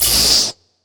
lightsword blaster block; soundscrate

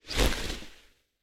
open wings; soundscrate

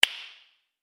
Face Slap

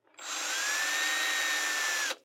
drill fast medium; SoundsCrate

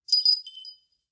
Short Wind Chimes; SoundsCrate

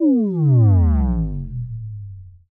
Bass Drop; SoundsCrate

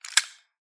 coins handling; soundscrate

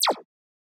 scifi weapon synth blaster

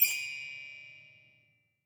Eerie High Ring; SoundsCrate

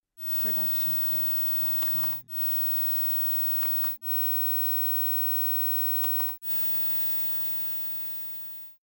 TV Flicking Channels White Noise; SoundsCrate

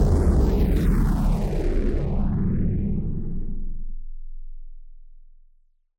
trailer hit distorted; soundscrate

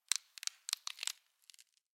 cracking

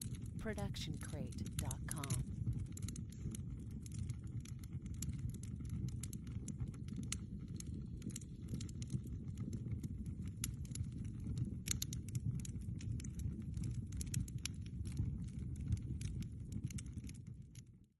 Indoor Fire; SoundsCrate

